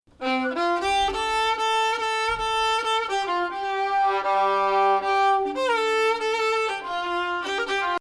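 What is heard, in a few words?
Bayati Samai Husseini Nahawand, Arabic music; opening could also be parsed as Ajam 3; Husseini 5 (Nah4); an instrumental genre from Ottoman turkish music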